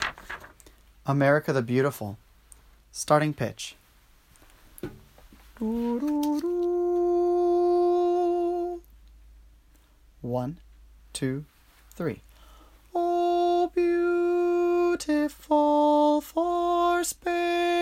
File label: america the beautiful - vocal